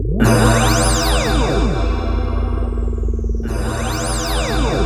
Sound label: SynthLine C3; 90 s Sample CDs; NiceBeats; SynthLine VOL-13